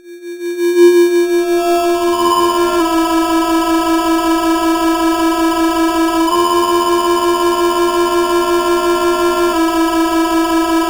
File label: horror